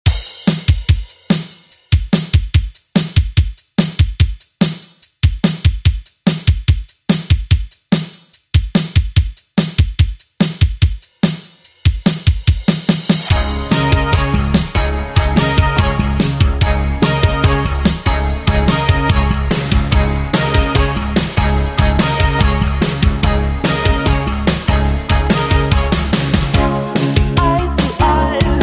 80s ReDrum; DJ Mhark

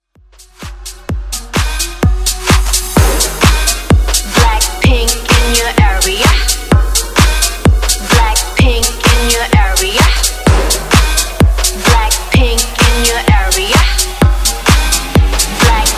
Bass House

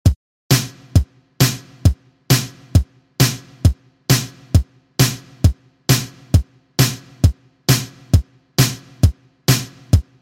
80s Dj Intro Outro, 134Bpm

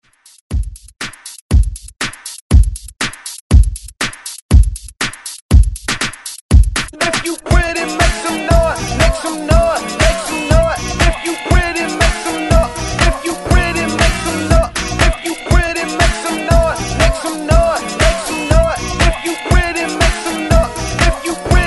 Hype Re Drum 120Bpm; DJDOLLS

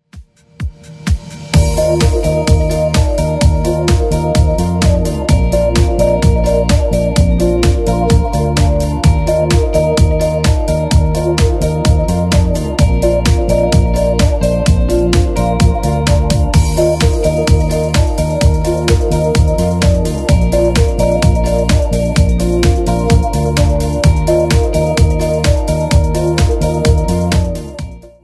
DJ Friendly Redrum 120bpm

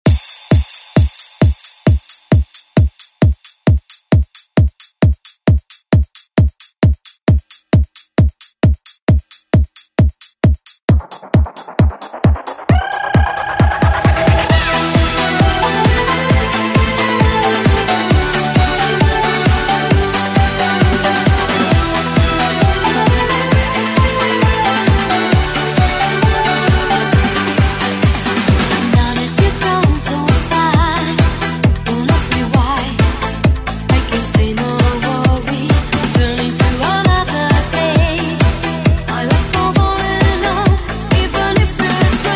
90s ReDrum; DJ Mhark

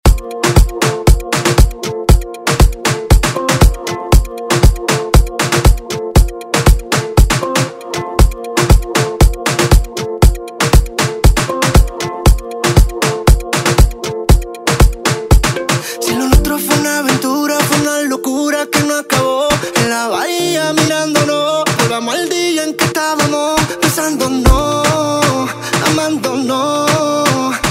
Reggaeton Redrum

